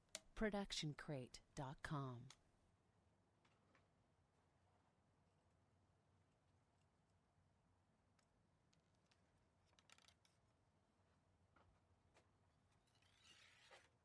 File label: archery foley load pullback fire